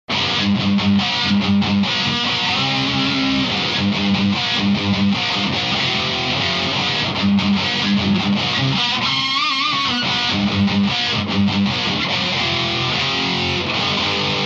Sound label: tubeman